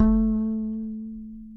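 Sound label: acoustic bass vibrato A 3 left; Masterbits CLIMAX 7 - Bass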